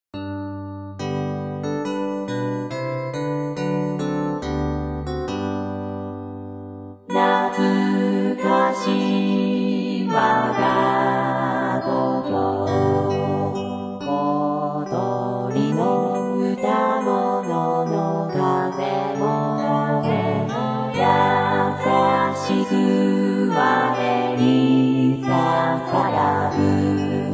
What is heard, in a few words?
chor